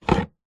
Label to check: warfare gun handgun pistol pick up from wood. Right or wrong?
Right